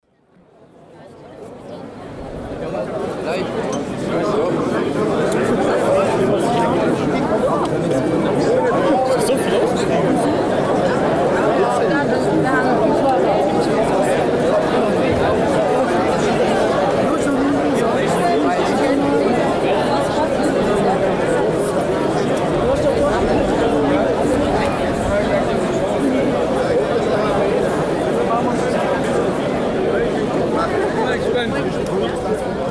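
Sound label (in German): Gemurmel Menschenmenge schwaebisch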